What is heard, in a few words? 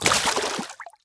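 water run, footstep; skyrim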